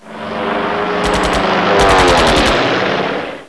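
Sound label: air combat; WW1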